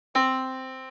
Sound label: yamaha Disk